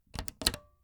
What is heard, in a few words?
Old Pc Button Turn Off